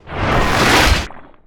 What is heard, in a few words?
Spikes Wood Emerge; SFX Kit; Foley - Misc